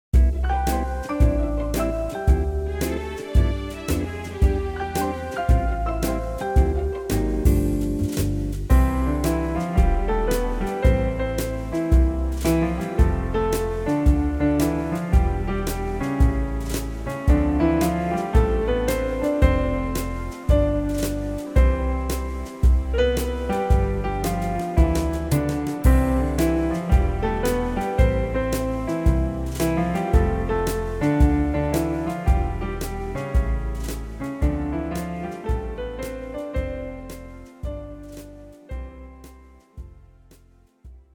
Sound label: SAUNTER